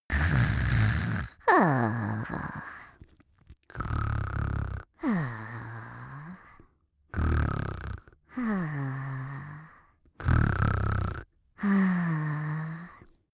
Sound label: goblin sleep snore, comedy cartoon; soundpool